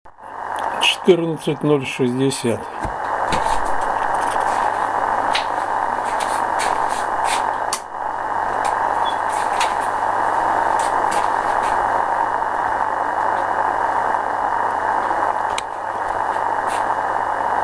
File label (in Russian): nikogo